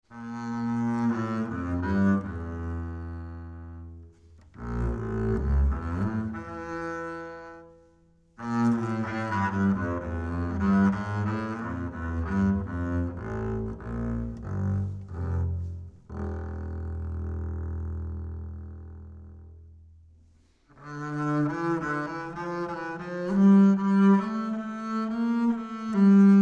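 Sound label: acoustic bass Bowed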